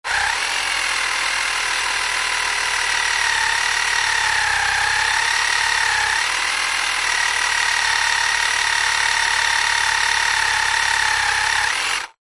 science fiction robot exploration device tracks movement whirr motor fast